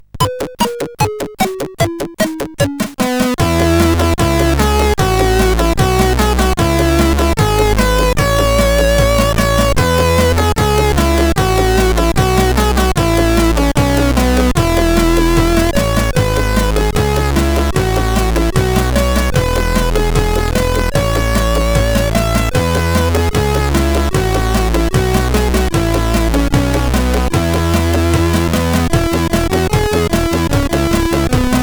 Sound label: tune, sid CSG8580R5